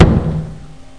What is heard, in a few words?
spike